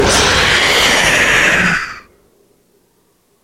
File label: jumpscare sound; fgnaf2